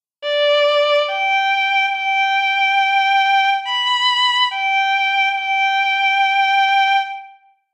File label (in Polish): skrzypce; Nagrania Lokomotywy; edukacja wczesnoszkolna